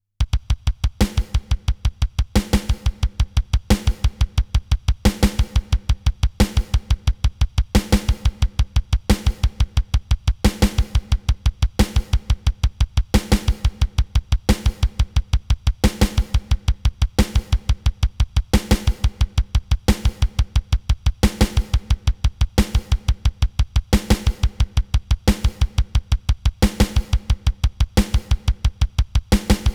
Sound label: dnb roland sc 33